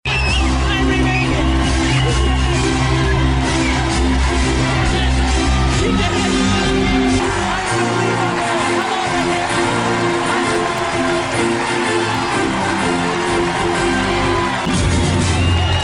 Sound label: Big Fanfare